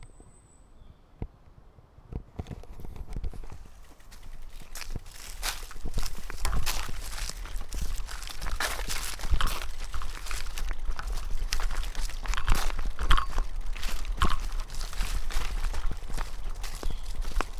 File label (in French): Poudrerie oiseaux